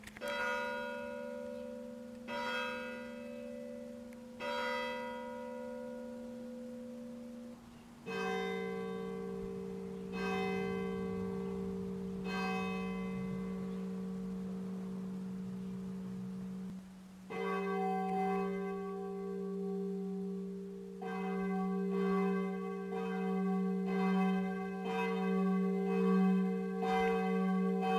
MUSIQUE CIMETIERE

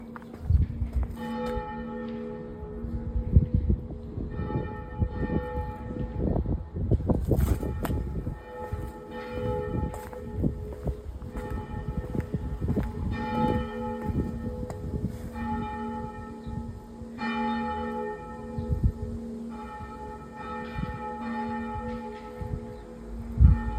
sonnerie, EGLISE, a tiré la cloche; MELLE 79; EGLISE SAINT HILAIRE; EXPO 2025 SAINTS INSOLITES

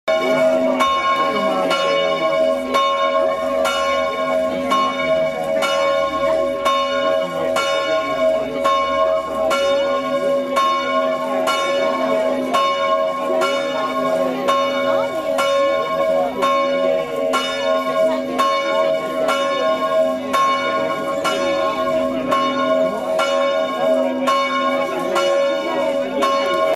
CLOCHES SORTIE MESSE; FONTENILLE 79

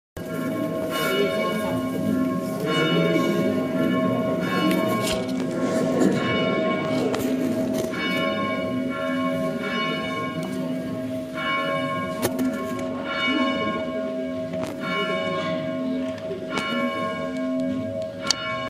ANGELUS MIDI; FONTENILLE 79

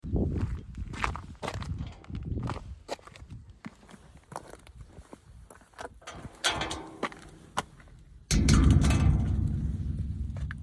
portail entrée; SECONDIGNE 79

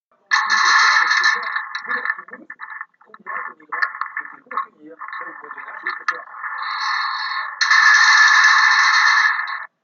Portail; MONTIGNE

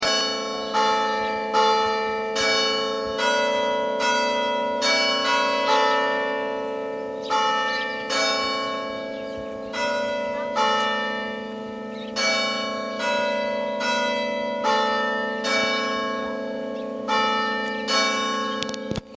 CARILLON; SAINT GAUDENT 86

